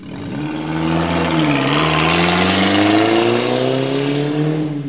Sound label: car drive away